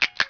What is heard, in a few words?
pod switch